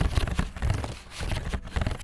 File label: Bumpy Texture; BB Retail Therapy Sample Pack